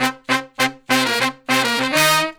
Horn Section

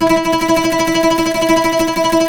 TREMOLO, Turkish Oud

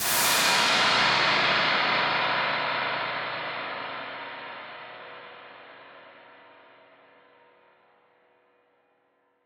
Noise Verb Decays; stereo toolkit samples

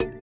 VIBRA; Spectrasonics; Metamorphosis

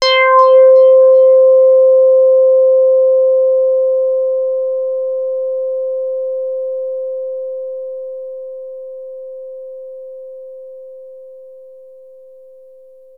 Access Virus B; Synthworx

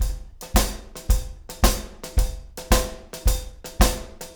Room

